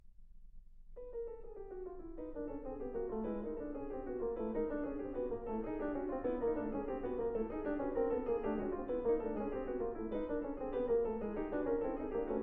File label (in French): etudes pour piano